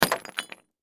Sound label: Brick Throw Break Cement; Cement Surface; Foley; Rocks; Materials; Blastwave